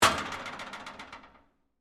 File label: Locker Rattle, Sports, Locker, Door, Rattle, Movement; Miscellaneous Sports; BlastWave